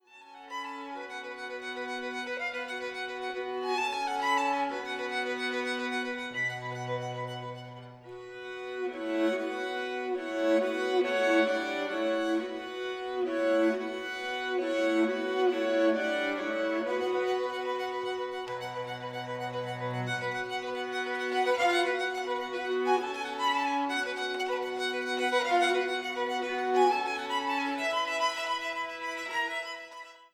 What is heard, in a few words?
Quartet